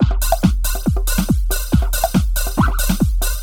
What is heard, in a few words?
PHATT BEA